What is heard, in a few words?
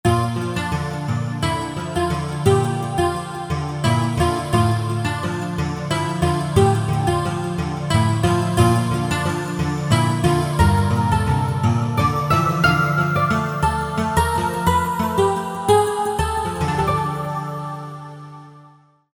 Voxy Nylon